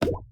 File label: symbol Stop On Ground; magic maid cafe